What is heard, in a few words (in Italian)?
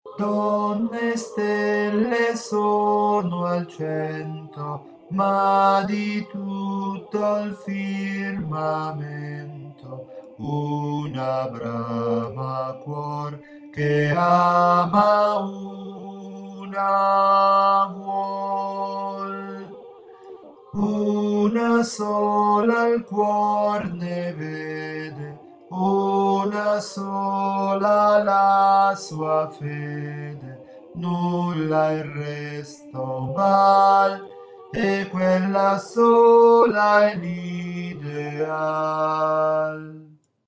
operetta alti; operetta 01 alti